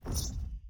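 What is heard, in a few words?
environment building sold whoosh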